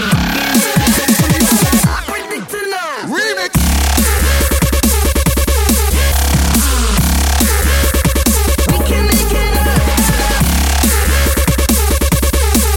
dub step mashup ringtone

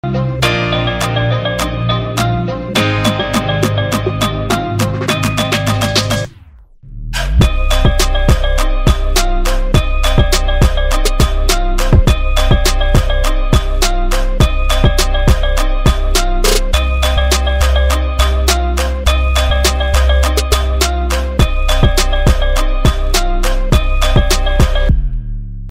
instrumental ringtone

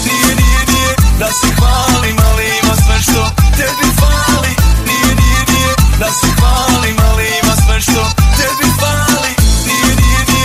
norwegian tone ringtone

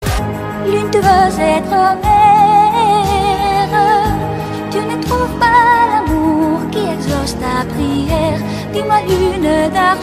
french ringtone

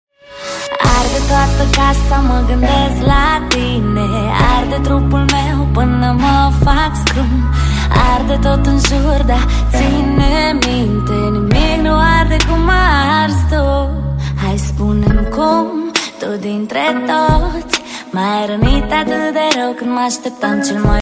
romantic ringtone

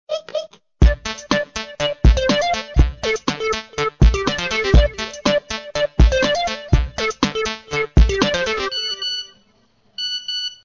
iphone notification; iphone 7

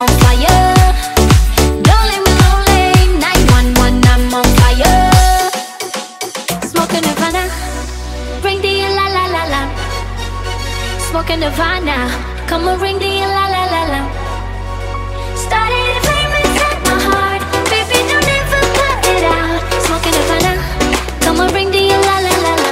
la la la turkish ringtone

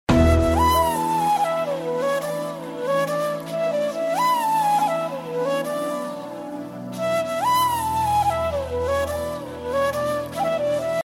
Huawei guitar ringtone

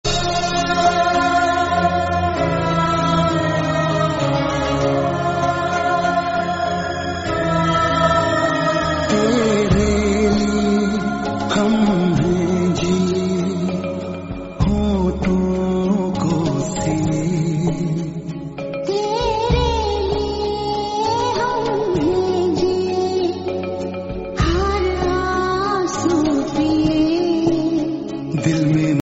broken heart ringtone

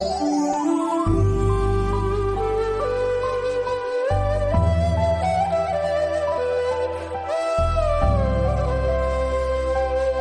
turkish relax ringtone

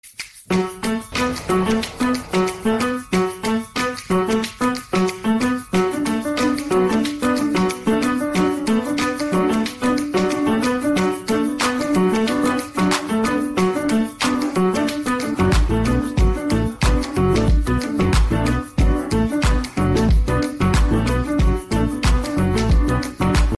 spanish mix ringtone; iphone 6

